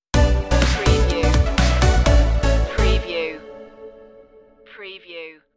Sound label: fireflies stinger